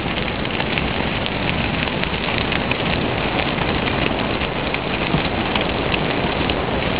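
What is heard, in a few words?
Fire Medium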